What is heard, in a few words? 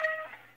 Casio battery low